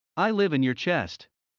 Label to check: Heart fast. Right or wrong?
Right